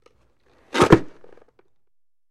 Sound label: Football Helmet